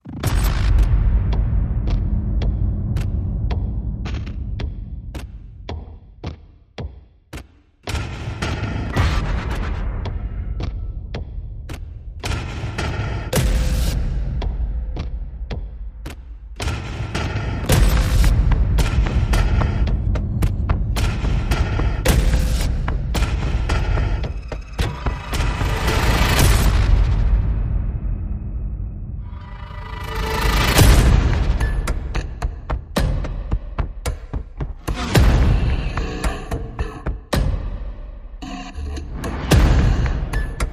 Percussion and Effects

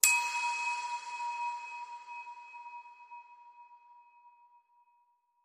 Jingle Jingle Chime Lo

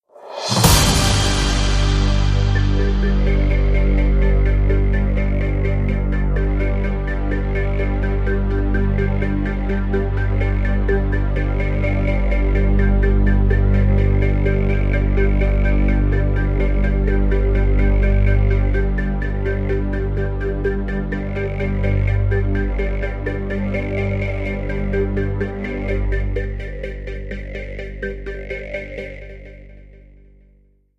News Alert No Blips

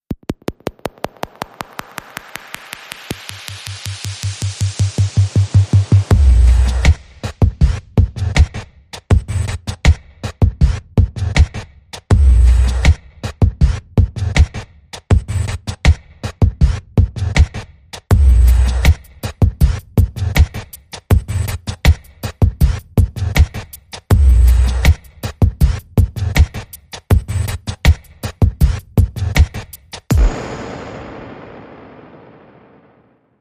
Beat

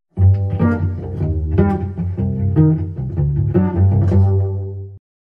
Cello Suite 3 Pizzicato

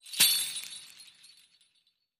Bell Strike; Santa

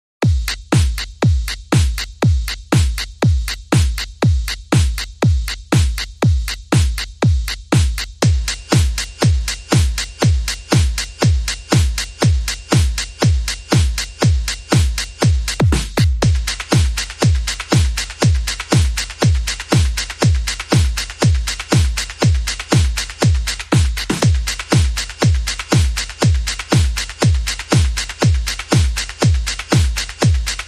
Drums Only

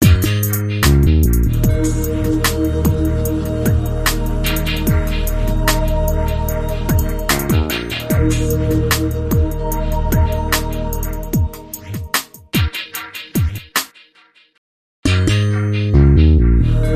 How Loving, Melody Only Mix Beats Only

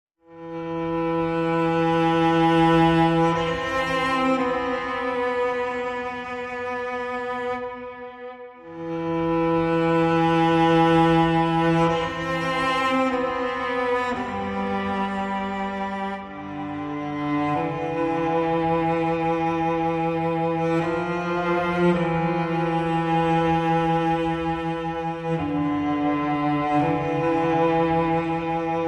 Cello Only

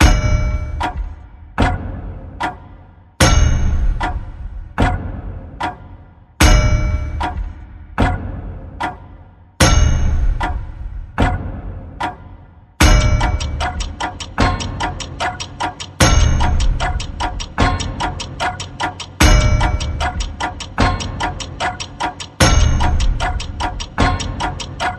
Madness Suspense Clocks

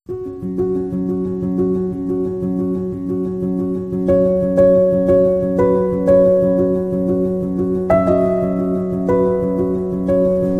Birds In Flight, Piano

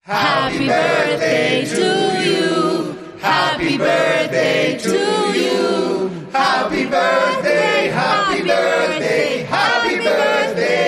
Adult Vocal Off Key Acapella Happy Birthday

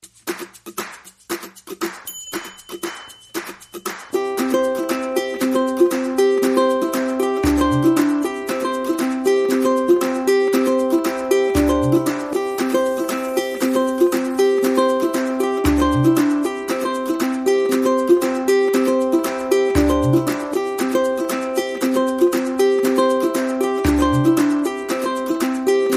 Light Mix (Only Ukulele and Percussion)

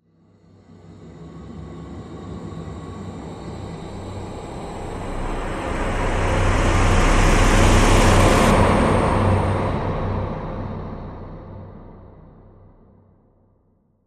Predator Hollow Tomb Rise

